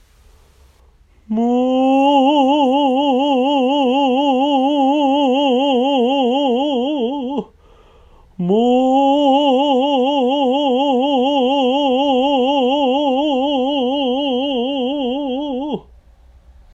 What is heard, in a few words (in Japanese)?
Mo 女性